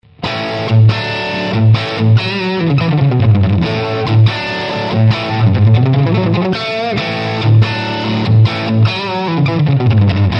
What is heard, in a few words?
plexi; PODxtLive